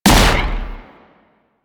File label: Railgun Shot; Weapons; Warframe; Archwing